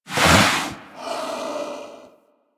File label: Snow Critter Vocal Breathe; Warframe; Hunting Critters